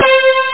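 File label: BUG STRING; Groovy Bytes: Behind the Moon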